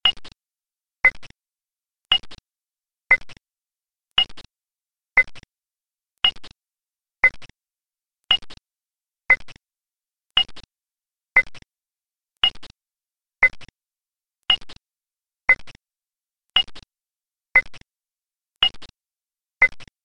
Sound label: end turn alarm